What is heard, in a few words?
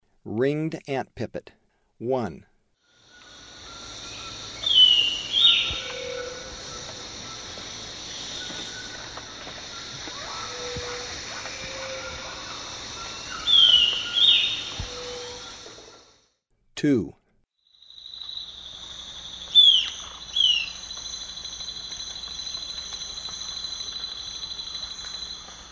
Ringed Antpipit; S. America; Eastern Lowlands